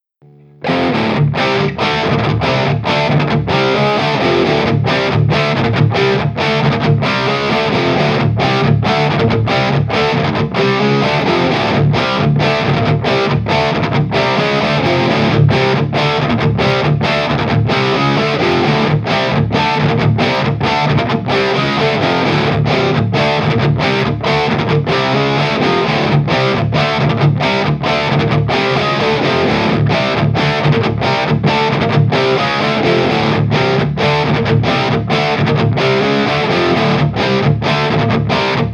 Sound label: Guitar Sludge